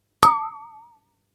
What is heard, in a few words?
alert; wow; BigWigs